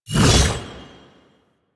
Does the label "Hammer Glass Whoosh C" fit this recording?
Yes